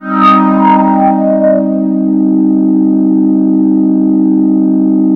SE-1 Overdrivin'; E-MU Producer Series Vol. 6 – Analogia Project; Strictly Synthe

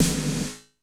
GT S909, GATE

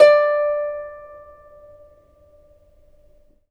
CEL HARP D4; USB Soundscan vol.23; Historical Instruments; AKAI; CEL.HARP1

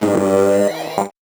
VocoBit Noise, 8-bit; musicradar; 8-bit bonanza

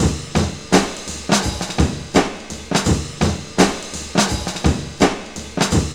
JAZZ RAPPER 84, Funky Elements loops; Zero-G

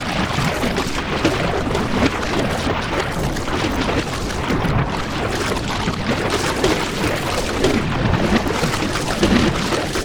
ACID WATER; Rarefaction; Poke in The Ear With A Sharp Stick

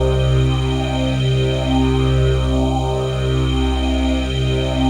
PHASE PAD; Best Service; XX Large Pads; Sample CDs

